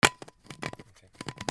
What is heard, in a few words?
HOUSE